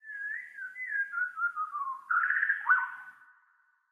Amb Jungle Birds

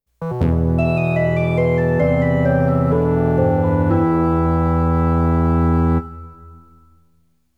Chief Defeat Jingle; tokimeki memorial forever with you

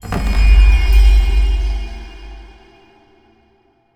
shrine checkpoint

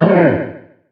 Turtle pain

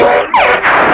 Scream

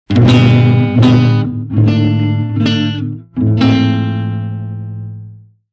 Bad Guitar; Dreamweb 1

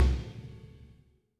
Loop Tail; Good Job

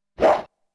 Hoe Swing; Namco Game Sound Express Vol.1; Valkyrie no Densetsu

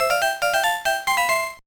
Jingle; Licca-chan no Oshare Nikki; GBA

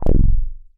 SFX - Noloot; browserquest; android

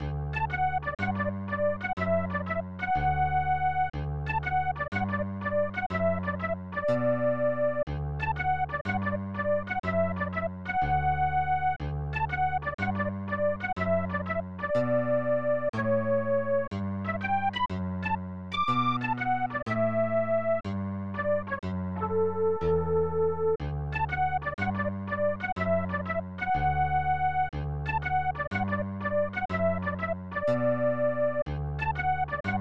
Underwater Ruins; Shonen Ashibe 1992 SNES

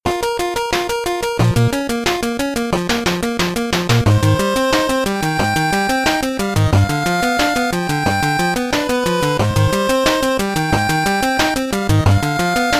BGM 8bit; Mighty No. 9; 8-bit soundtrack

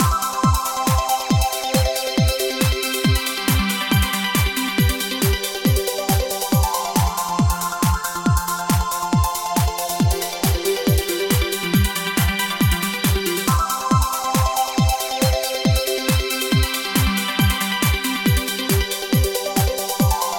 Happy Trance Loop; Winx Club Join the Club; PSP

